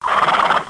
HORS HIT; DAoC; Toa; Gamestar

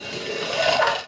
BOUNCOUT; Marbles

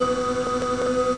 reel roll; VEGAS Girls

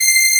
Strings C5; EverQuest

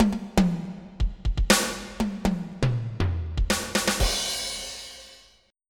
2 bar fill

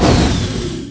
SHIP HIT; ast miner

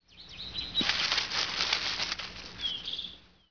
rustling; Ambient; Zeus

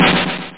Snare Echo

